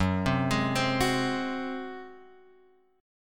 F sharp Suspended 2nd Flat 5th F sharp, Chord; F#sus2b5 Chord {2 3 X 1 1 2} arpeggiated

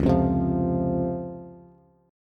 Db Major 7th Db down Bass; strummed; JGuitar

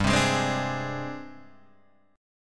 Ab Major 7th Flat 5th G

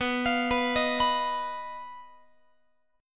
B-Major Flat 5th B down Guitar; BMb5; arpeggiated; x,19,20,21,19,22; 4A,4E,5A,5D,5G#,6C#

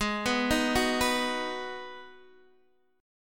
G sharp Minor 7th G sharp, Guitar Open D; x,11,9,9,9,9

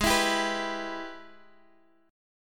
Minor Major 7th Sharp 5th, Guitar, Open D; Gsharp; x,11,14,13,14,x

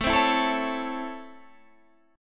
C sharp Minor Major 7th Sharp 5th, chord

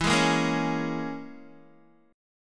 E 6th Flat 5th E down Guitar

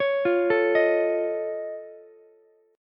F Augmented 7th F Ukulele, chord; F+7 arpeggiated; 18,17,17,18; JGuitar

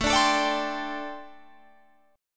G Suspended 2nd Suspended 4th

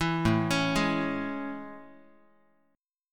A Major 7th A, Guitar; AM7; arpeggiated; 7,5,4,6,x,x

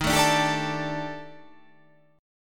D sharp Major 7th Flat 5th, down, chord

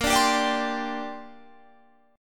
Bb 6th Add 9th Bb Guitar Half Step Down

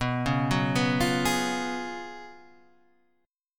Gb Major 7th Suspended 2nd Suspended 4th

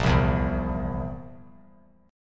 Bb Suspended 2nd Flat 5th Bb down; strummed

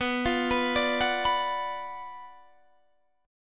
F sharp Major 7th Suspended 4th Sharp 5th B

